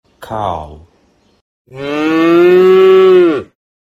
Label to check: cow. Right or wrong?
Right